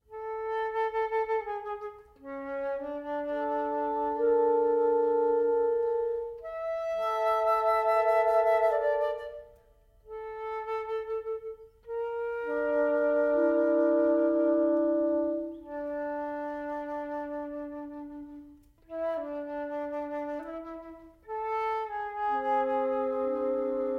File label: wind quintet, variations